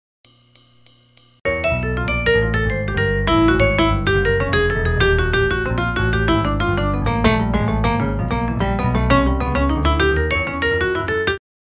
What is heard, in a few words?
8 bars; tp aratori vol 1